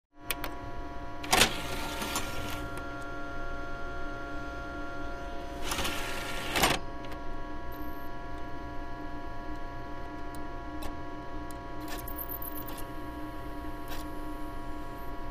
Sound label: CD-DVD drive, disc reading; computer